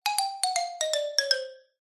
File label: cartoon xylophone descend; zapsplat